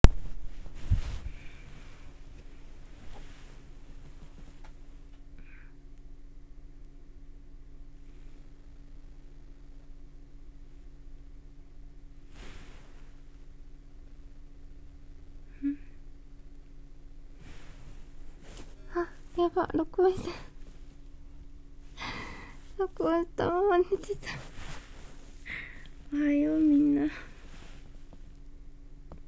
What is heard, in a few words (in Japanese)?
ガチ寝起き